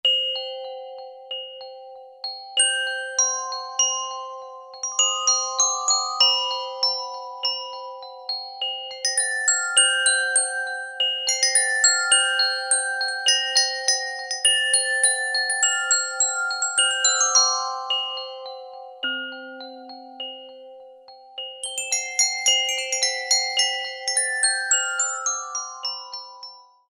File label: Glock, Yamaha TG500